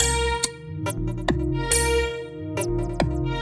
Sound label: Dark Ride, Synchro Funk, Beats; Sonic Foundry (Sony Creative Software)